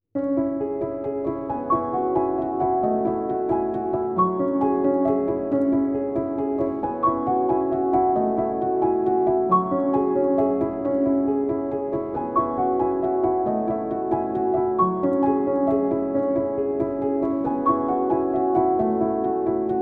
Light On The Fields Underscore, Underscore – Piano; Narrative Mind